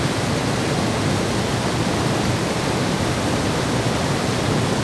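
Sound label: waterfall; portland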